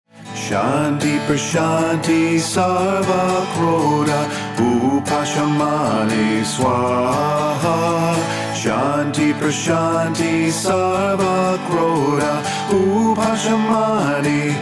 Shanti Praschanti Sarva Krodha, Chants

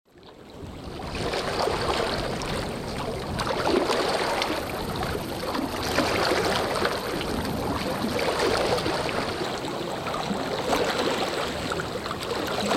Lake at Dusk

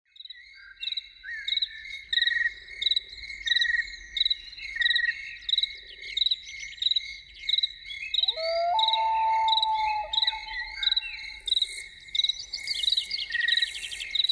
Evening with Loons

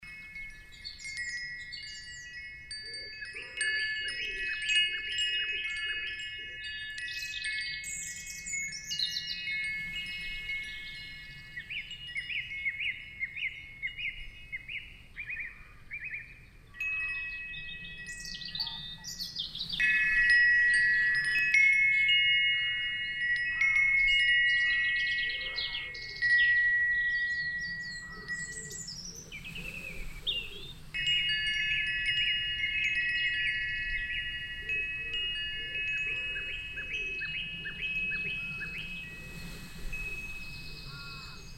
Forest Wind Chimes

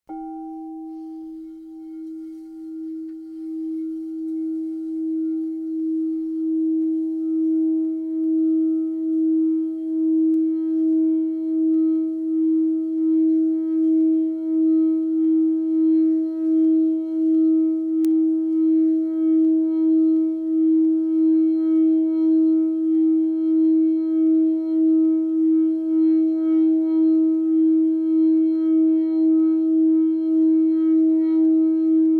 Quartz Bowl D Tone; Sacral Chakra

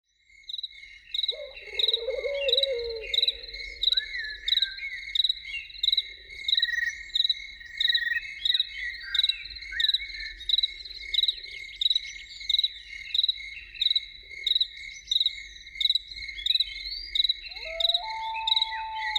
Evening with Loons

